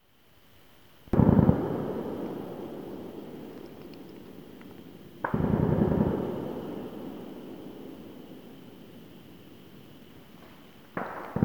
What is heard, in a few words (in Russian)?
стрельба